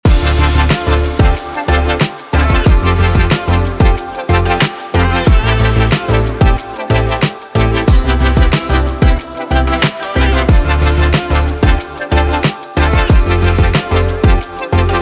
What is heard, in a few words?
music background looping